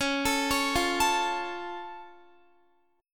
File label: Db Augmented, down, Guitar; arpeggiated